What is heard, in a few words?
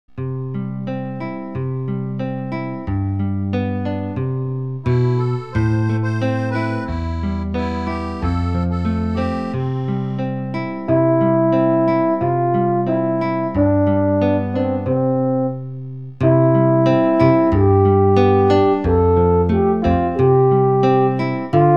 French Horn